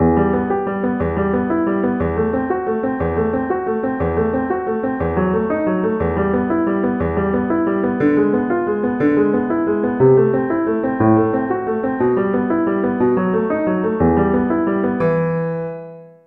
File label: Prelude In Em piano; Caracssi